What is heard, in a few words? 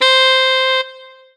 Roland SC-88 Alto Sax C5